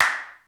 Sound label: AMB P CLAP, HALL KITS; Crypton - DrumBase5000